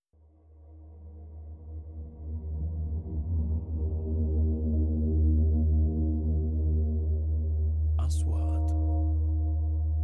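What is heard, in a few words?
3D SFX Trailer; مؤثرات تشويقية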